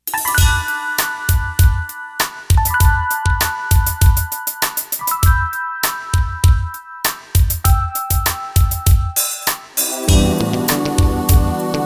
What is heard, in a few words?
No Sax No Vox No Lead Guitar Of E minor